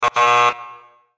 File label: combo break; O2Jam+UUltimate